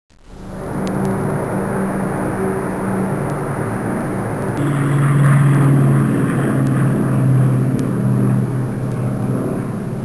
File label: Air Force Prop Plane; Soundsation; Vehicles